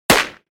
gun

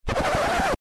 scratch, reverse

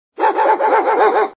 bark bark bark

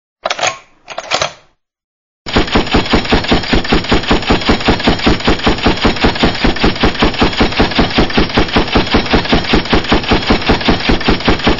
gun

